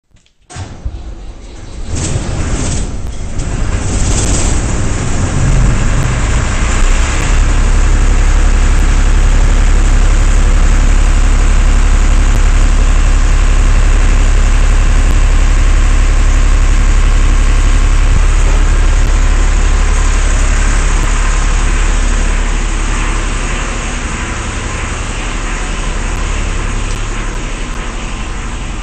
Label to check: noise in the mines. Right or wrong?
Right